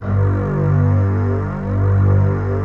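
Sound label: string, orchestral strings; Greytsounds VOL-1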